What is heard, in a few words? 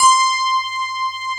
FM ELP C5 R, Keyboards Old School; USB Soundscan vol.09; AKAI; FM ELP 8